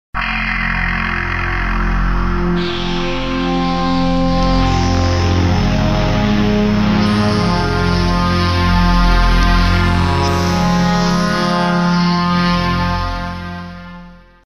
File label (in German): Chor und Pad Modelle + Resynator Mod